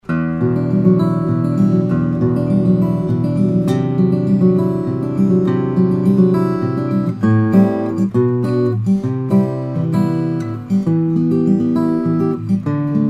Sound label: steel string guitars, flattop; oriskany a om